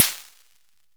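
snare drum, drum machines, Univox Micro Rhythmer 12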